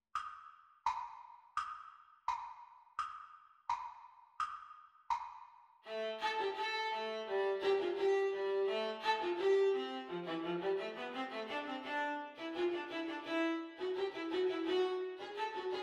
Viola Duet Playalong Exam Speed

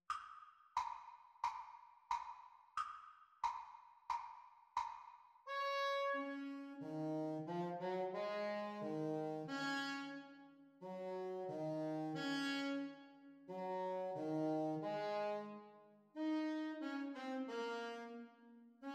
Sax Duets Playalong; Exam Speed; Alto Saxophone duets; Grade 3

